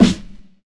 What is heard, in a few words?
boomy acoustic snare sample f sharp key